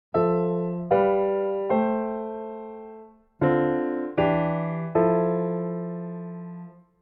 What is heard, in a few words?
voicing